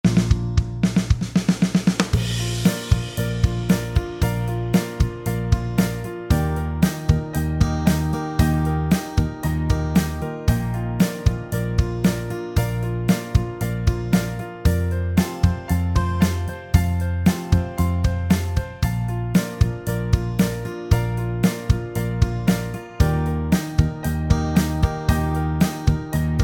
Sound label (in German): Hymne Instrumental; Hasenfaengerhymne